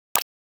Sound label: Button Click - Classic; Hollywood Edge; sound designer's toolkit v2